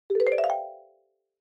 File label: Xylophone Slow Short Up